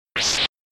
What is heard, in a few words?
Whip Sound; Super Castlevania IV